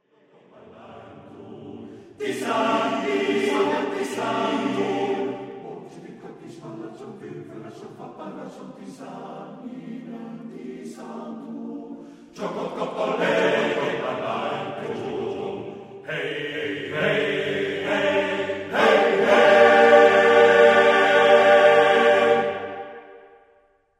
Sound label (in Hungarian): Magyar nepdal